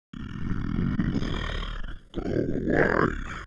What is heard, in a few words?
attack; scmod; humanerror; agrunt